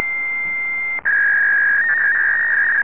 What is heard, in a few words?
Fax; Aldus PageMaker 5.0 German